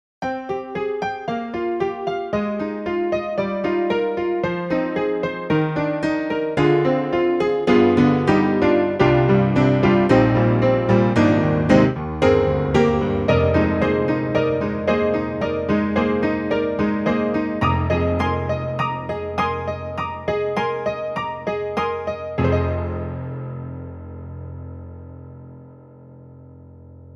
EDIROL Super Quartet, Brite Honky

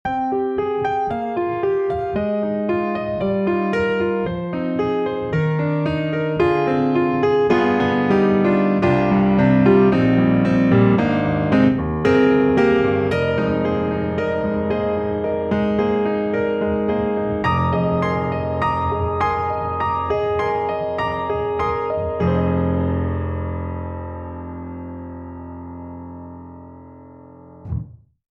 Grand Rhapsody Piano, Sentimental Grand; Waves

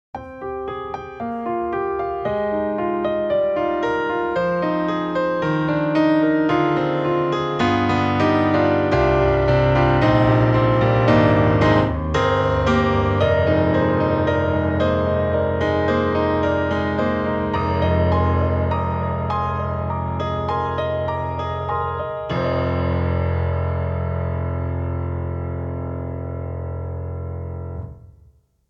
Rosewood Grand Vocal Comp; Orange Tree Samples; Evolution Rosewood Grand

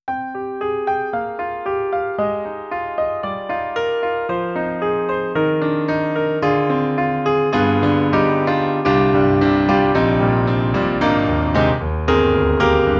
Korg M50 Grand Piano

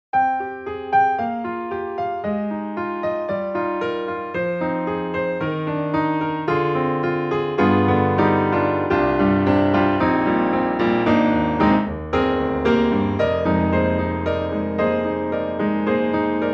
Studio Grand, Audience; XLN Audio; Addictive Keys

